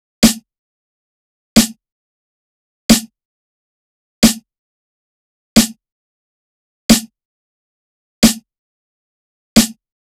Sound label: Drum Loop 90 BPM Snare, Boom Bap; Loop Stems; Cymatics - GHOST (Beta Pack); Armed Drum Loop